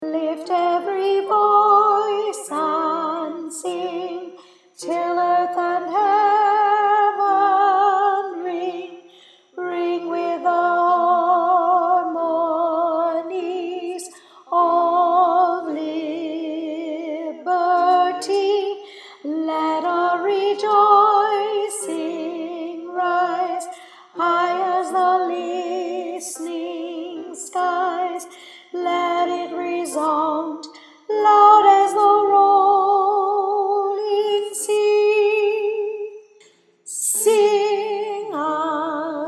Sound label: alto lift every voice and sing